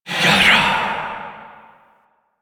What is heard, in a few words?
Fire Whispers; Warframe; Tenno; Grimoire